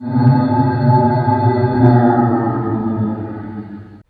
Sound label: skinwalker alert; skinwalker fleshgait; slender skinwalker